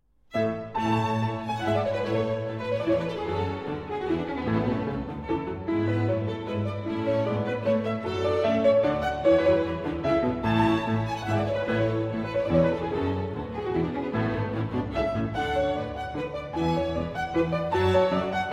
Keyboard Concerto in A Major III. Allegro Man Non Tanto; BWV 1055